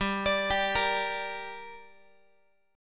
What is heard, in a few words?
G Minor G down Guitar, chord; arpeggiated; JGuitar